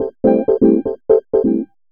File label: HOUSE EXTRA, 125